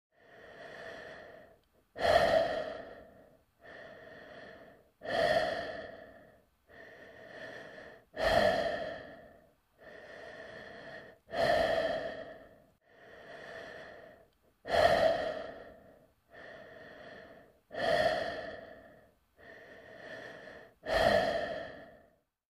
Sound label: Breathing Space Helm